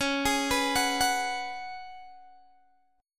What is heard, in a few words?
C sharp 7th Suspended 4th C sharp, Guitar Open G